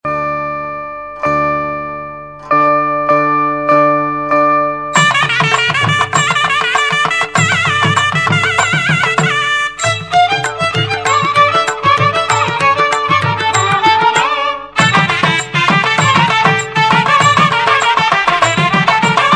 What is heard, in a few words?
tamil devotional